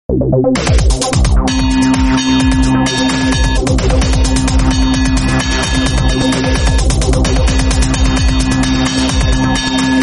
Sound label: temple bell ringtone